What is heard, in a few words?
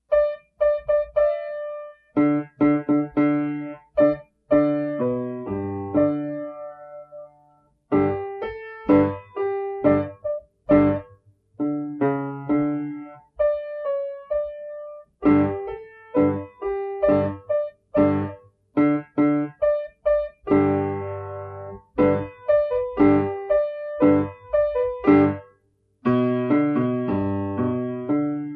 Golden Trumpets; piano solo; Instructional